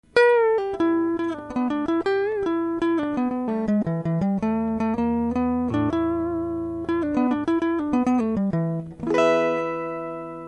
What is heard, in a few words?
strat series parallel; ToneWorks